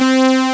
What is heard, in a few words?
Syn, SYN ANA1; Best Service Gigapack 1